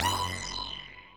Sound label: stab